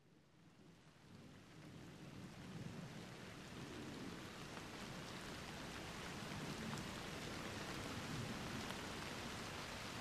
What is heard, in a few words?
Rain Sleep; Better Sleep Frequencies